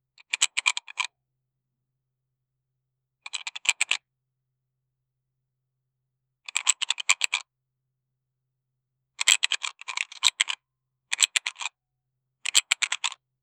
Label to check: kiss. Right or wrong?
Wrong